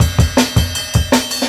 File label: 160, Jungle Breaks; Original Jungle Breaks